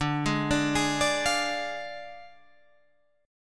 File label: D Diminished Guitar Open G, chord